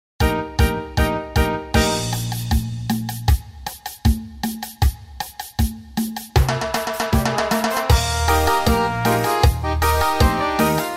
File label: midi